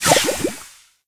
frost dagger melt; Crypt of the NecroDancer

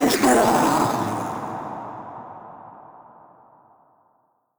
death; Crypt of the NecroDancer

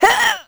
squish; Crypt of the NecroDancer

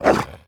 hellhound attack; Crypt of the NecroDancer

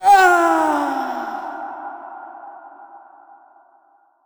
death; Crypt of the NecroDancer

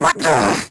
teleport; Crypt of the NecroDancer

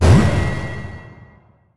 spell bomb; Crypt of the NecroDancer

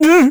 ice slip; Crypt of the NecroDancer

